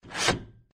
levers; p00gie

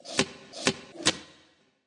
flippers, tardis; p00gie

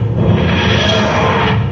flight loop, tardis; drmatt

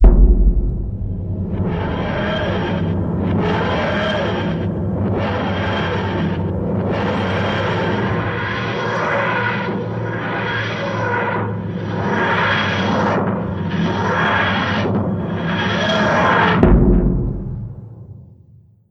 full ext, tardis; p00gie

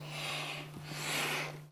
pump; doctorwho1200

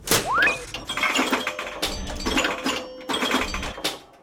pinball machine; doctorwho1200

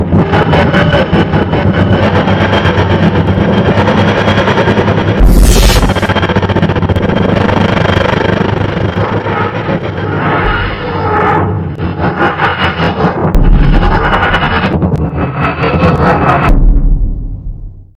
tardis full damaged; drmatt

